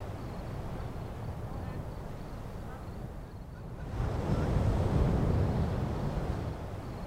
light ocean

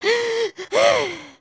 panic, emotes

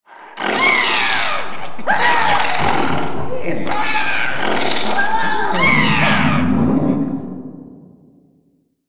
Monster Crowd

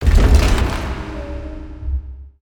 distant objects rattling; ninjago

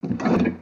Chest Idle

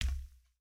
Foot Steel Boot Walk, footsteps

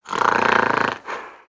atk idle, creatures organic

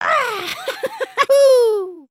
dance; emotes

